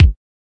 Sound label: kick